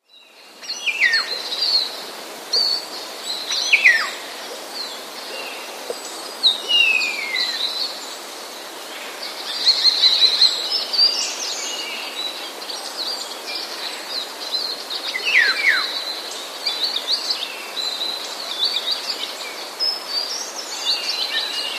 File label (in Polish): szpaki, tym nagraniu szpaki gwiżdżą i skrzeczą podobnie do wilgi, dzwonią jak kosy; wroblowe; zapozyczenia